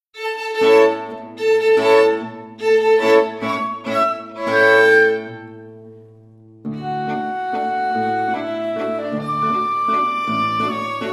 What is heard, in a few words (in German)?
Walzer Walzer